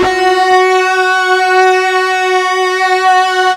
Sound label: FUZZ F#3, Guitar; East West; Steve Stevens Guitar Samples Collection